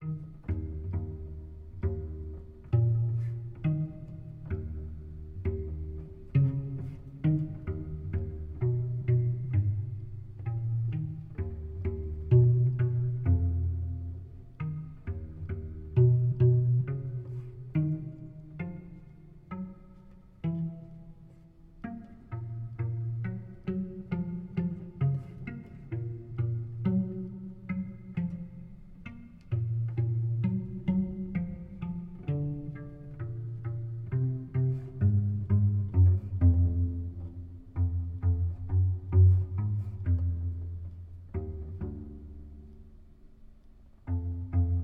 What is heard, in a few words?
Contrabass; Assassin's Creed Syndicate